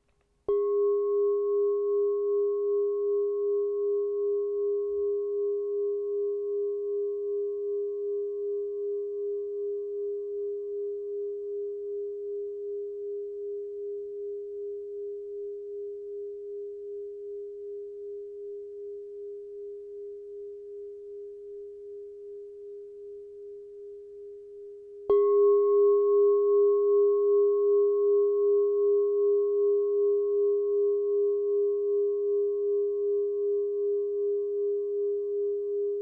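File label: 6inch G sharp chime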